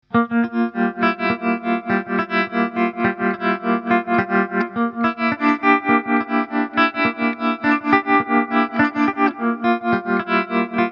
Tremolo; BIAS

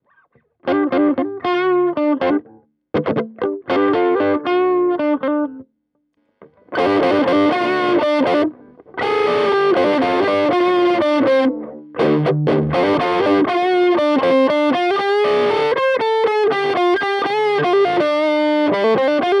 Compadre dirty Boost Mid bridge

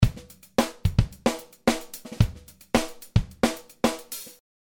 Drums Original

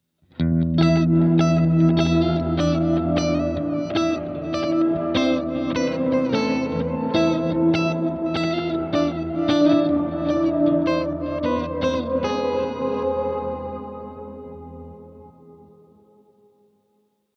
Riff; WALRUS LORE

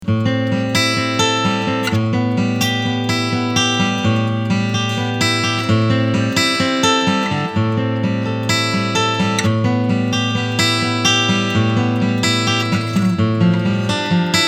Framus Legacy Series FD 14 SV Picking

